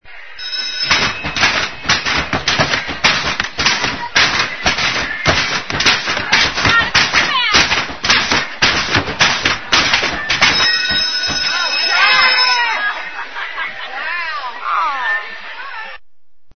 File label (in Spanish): videojuegos videojuego